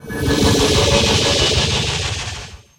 reward; Fate Undiscovered Realms

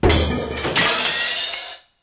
break; Monster girl quest Part1,2,3; Visual Novels

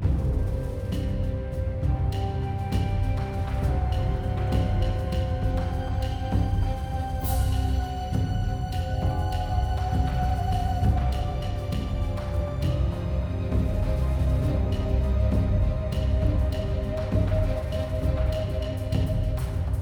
Dungeon, MUSIC; Fate The Cursed King

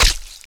light Flesh; Fate The Cursed King

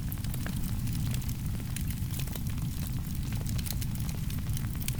fire; Fate Undiscovered Realms

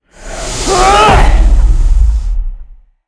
Rage, spells; Fate The Cursed King

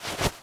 cloth drop; Fate The Traitor Soul; ITEMS

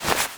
cloth get; Fate The Cursed King; ITEMS

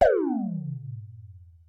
lvdown; Monster girl quest Part1,2,3; Visual Novels

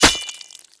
Slice Flesh; Fate

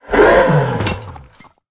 die, demon minotaur; Fate The Cursed King; MONSTERS

